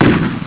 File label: boom; asteroids